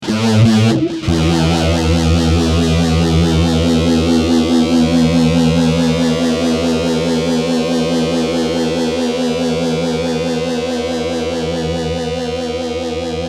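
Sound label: fuzz guitar, final phase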